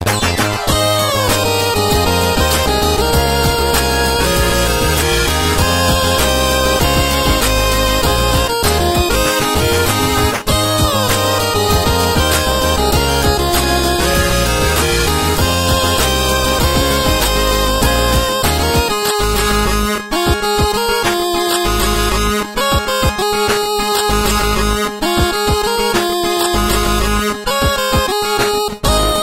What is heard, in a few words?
8bit